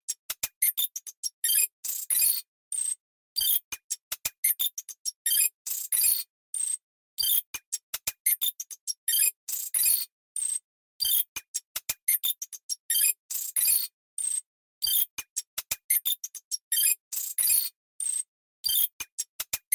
Robot Calculation Sound

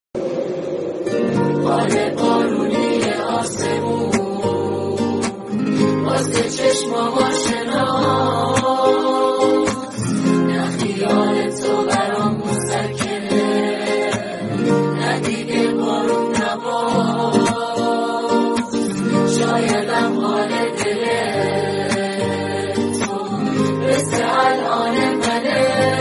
Guitar Version; iranian

